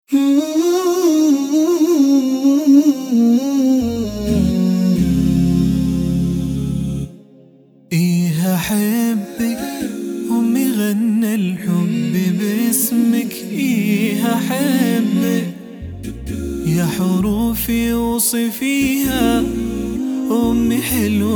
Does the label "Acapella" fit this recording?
Yes